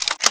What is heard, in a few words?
ump45 bolt pull; HL2-Nerv; NERVump45